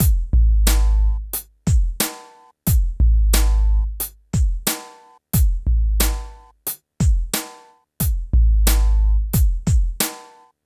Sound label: PHATT BEA